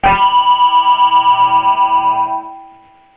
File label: harm, guitar singlenotes